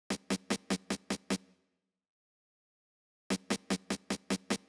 Snare, 075 Bmin, Drum Tracks

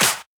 EDM Future Clap

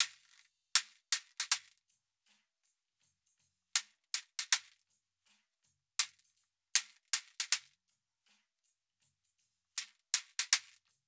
shaker A 080, Hip Hop India, 080bpm; Hip Hop India Modern Percussion; Apple Loops

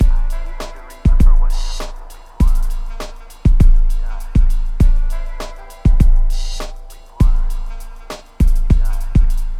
Drums Combo, 100 Cmin

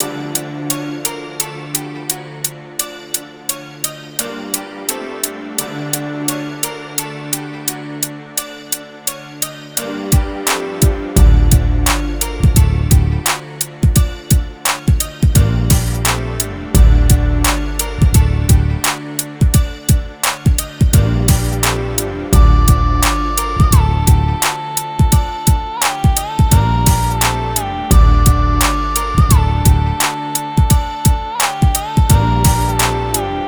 086 Dmin, Hip Hop Exotica

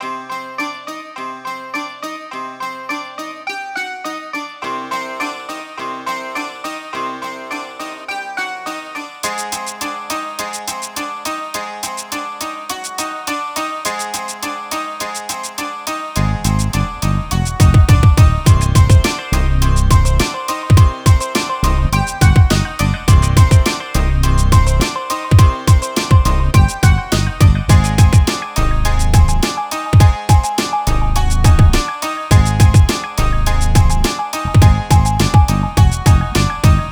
104 Cmin, Hip Hop Exotica

